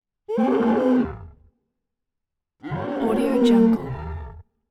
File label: subterranean beast